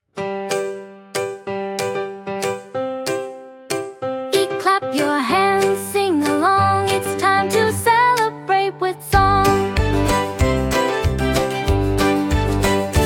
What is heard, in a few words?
Happy Birthday Song